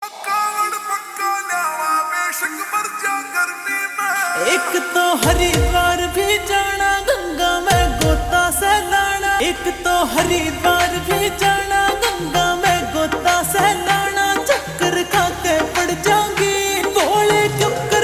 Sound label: Slow Reverb Version Ringtone